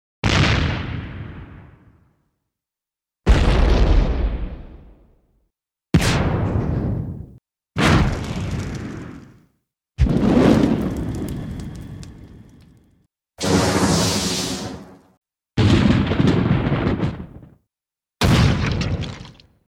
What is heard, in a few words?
noise